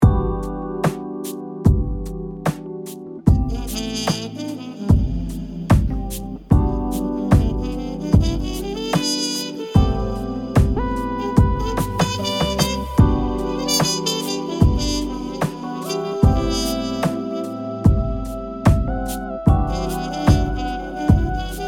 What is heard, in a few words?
jazzy lounge radio